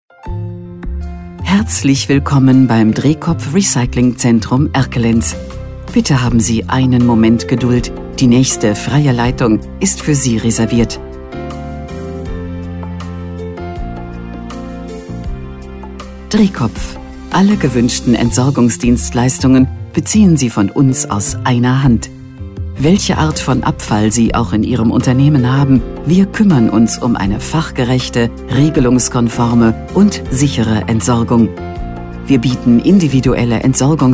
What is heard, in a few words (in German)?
Warteschleife